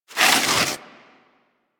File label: Armadillo Roll Snow; Warframe; Hunting Critters; Foley